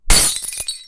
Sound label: light bulb breaking; Dark Village